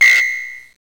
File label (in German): loesung nicht richtig; Sudoku